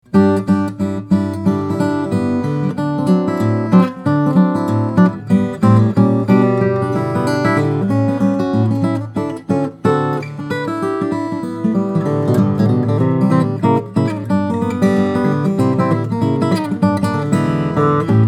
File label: g1, steel string guitar flattop; greenfield